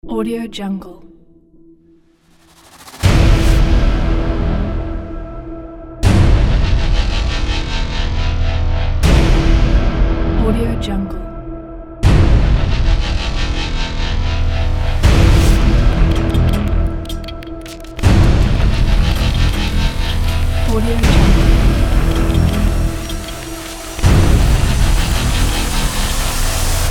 dark trailer

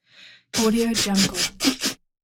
blow nose

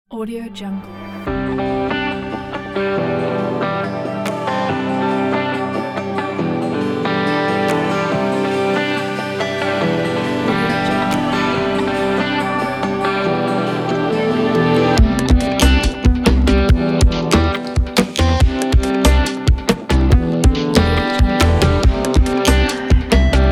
inspired energetic indie

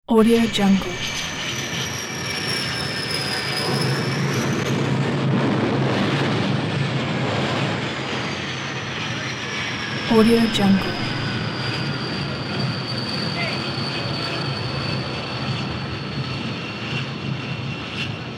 f4h phantom jet launch from aircraft carrier; sound ideas

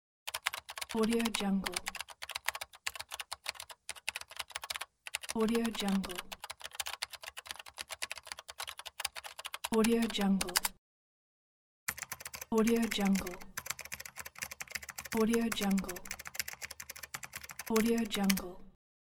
keyboard typing

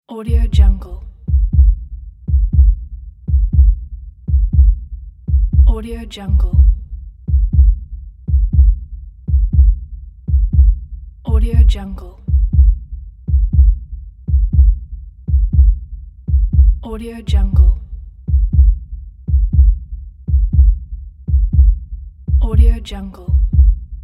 the heartbeat

